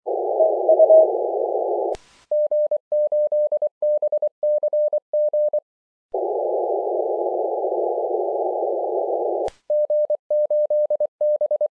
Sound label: cw qso